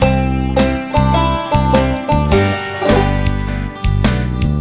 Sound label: Country, ringtones; Google; NewWave Labs